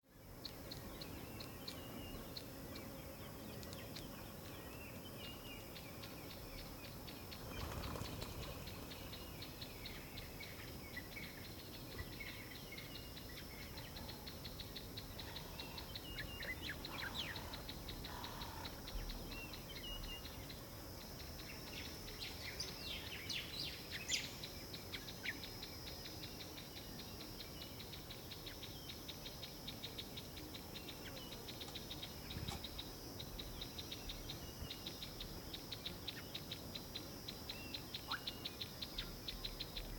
AMBIENCE Savanna Soft Water Hole Dusk Birds Insects Calm, South Africa; Nature; SmartSoundFX